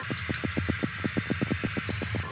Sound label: Melanogrammus aeglefinus Juvenile Sound